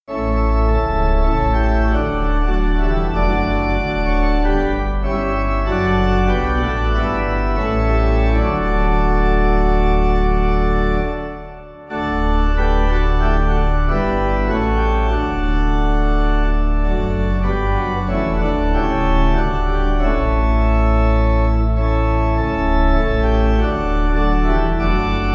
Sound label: How Beauteous Venice Pipe